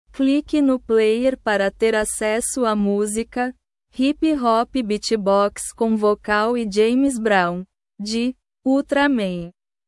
hip hop beatbox com vocal

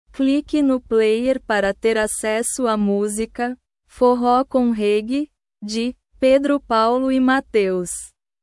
forro com reggae

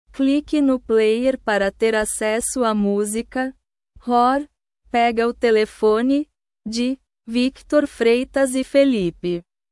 roar pega o telefone

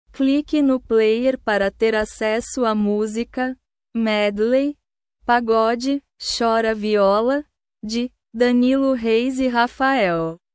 medley pagode chora viola

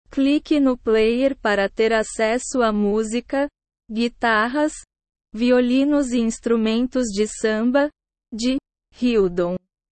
guitarras violinos e instrumentos de samba

